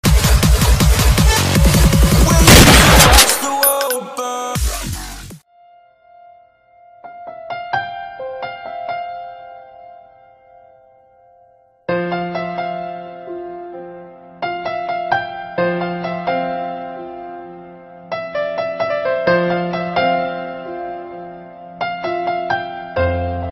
best piano emotional

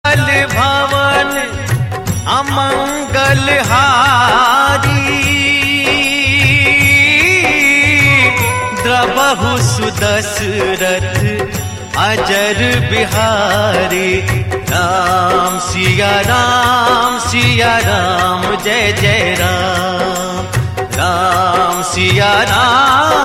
srilankan

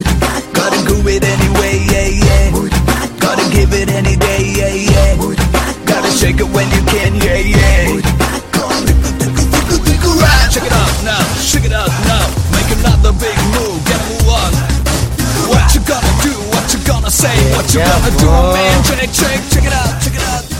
malayalam sad, ringtone

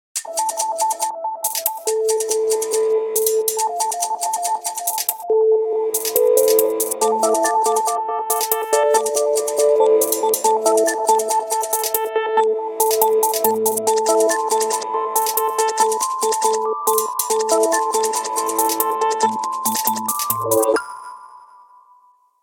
motorola moto m plus

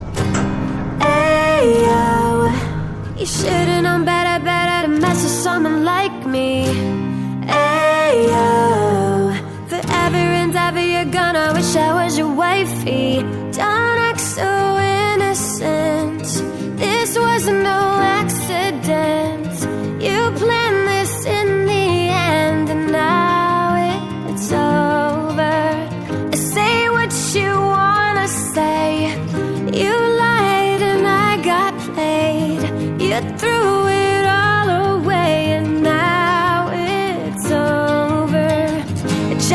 acoustic

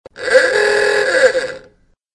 old car horn notification tone

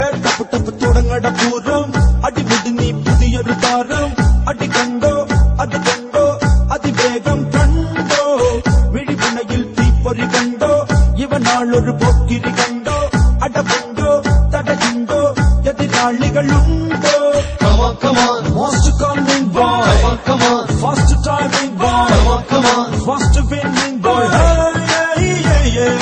malayalam tone

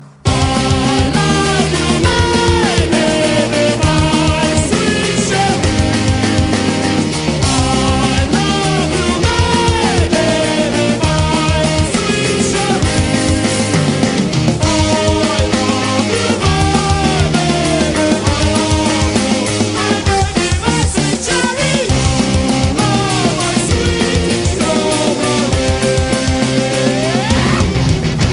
dutch

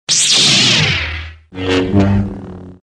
robo notification

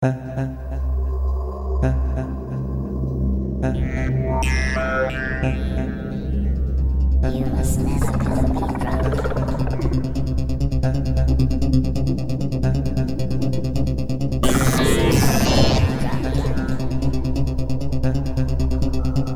norwegian android tune

